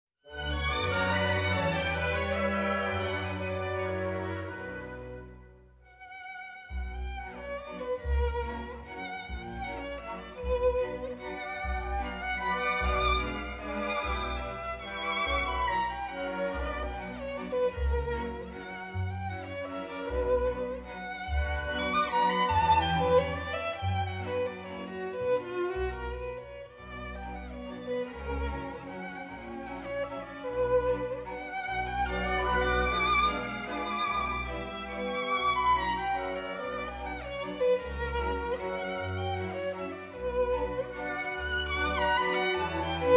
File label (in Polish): valse h moll op 69 nr 2; fryderyk chopin